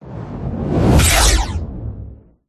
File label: whoosh; Audio Mangler